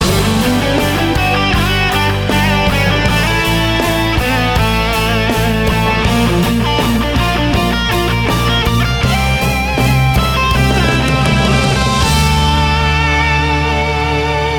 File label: Prize Gold Jingle; Gran Turismo Sport; Transmission Mode; Christmas Extra